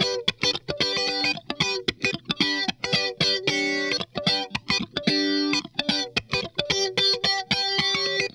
SQUASH, Funk Guitar; 90 s Sample CDs; Zero G

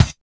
BD, 160 BPM, Drum & Bass; Ueberschall; Drum & Bass Flash Trackz

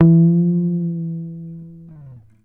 GTR TRIK, Jazzistic; Univers Sons

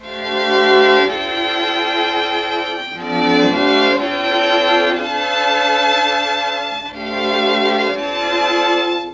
C4, Mellotron; Mike Pinder - Mellotron Archive CD

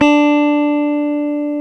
GTR, Dual Guitar; Club-50 - Foundations Roland

